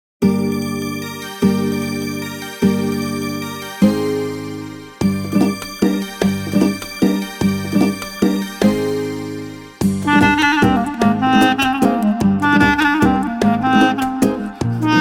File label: Guitar; Guitar Pack 1